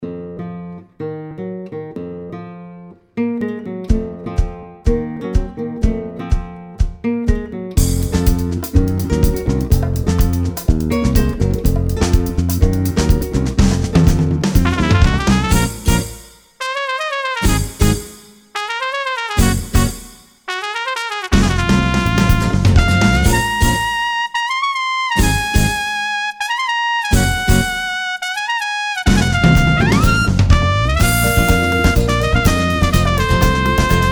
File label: Brazilian